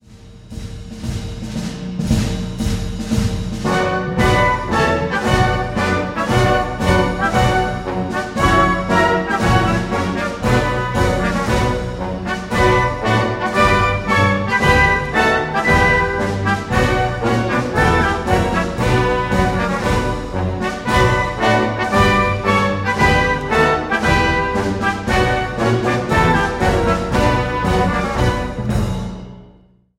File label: Military March